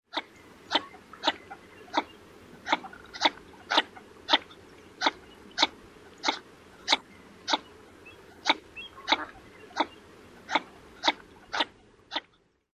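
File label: male chux calls, northern shoveler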